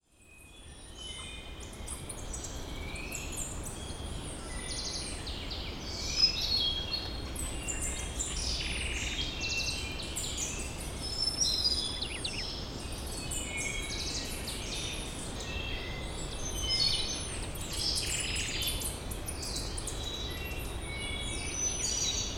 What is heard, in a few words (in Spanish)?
grabacion de paisaje sonoro; Irazú; historia natural